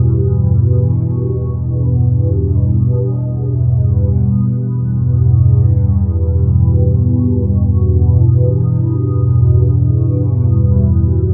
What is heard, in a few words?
Fluid Drone; Sonic Foundry (Sony Creative Software); Numina II